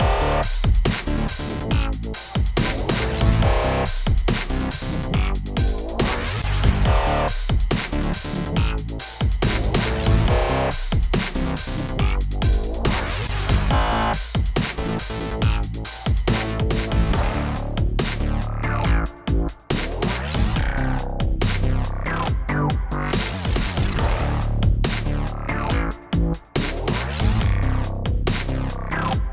Cyber Grunge; sci-fi

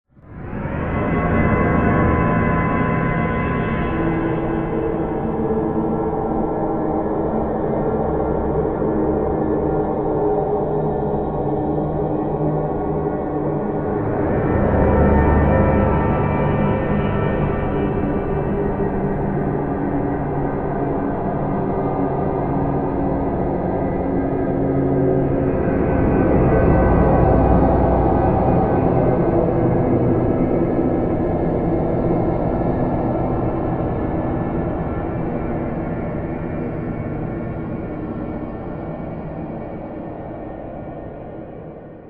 Dystopian Atmospheric

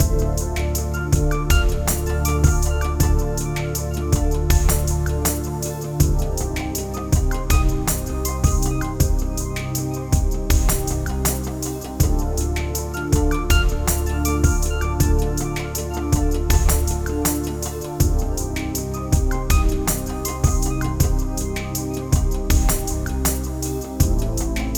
Cyberpunk Game Menu, sci-fi

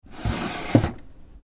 Chest Drawer Open

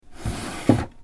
Chest Drawer Open

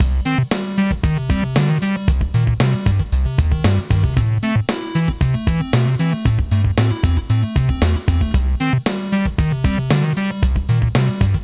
Arcade Quirkiness, chiptunes

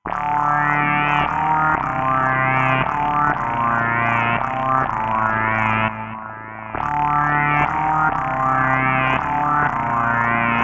Grumpy Slimeball from Outer Space LoFi; sci-fi

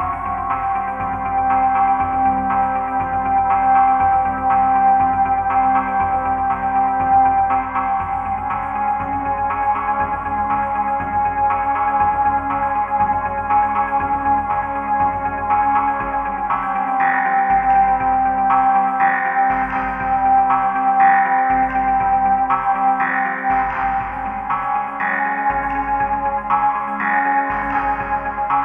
Uneasy Anticipation, LoFi; sci-fi

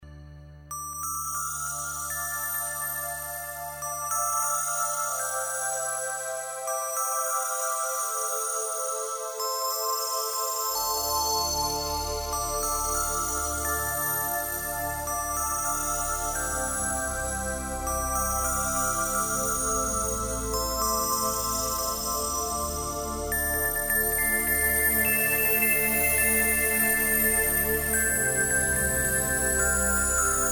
Looping

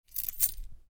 Coins

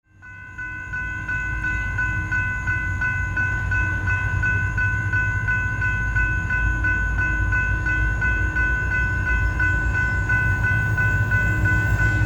Light Rail Train

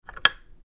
Drawer Latch

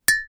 UI Quirky

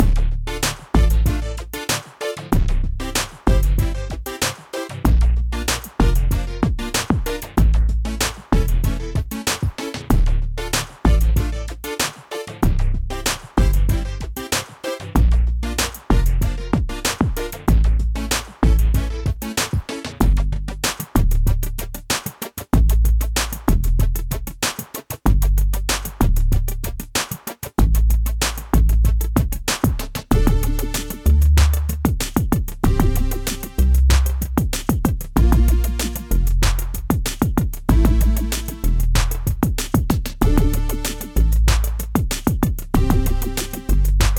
Coin Op Low Rider, chiptunes

